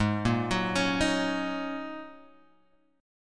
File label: Ab 7th Suspended 2nd Suspended 4th, Guitar, Open F; 8,5,6,8,6,x; arpeggiated